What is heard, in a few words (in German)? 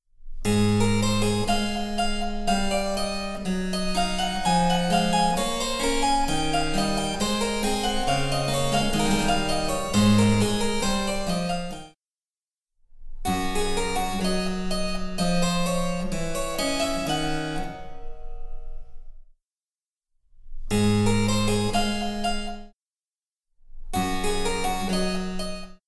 Cembalo; Mark Lindley WTC 1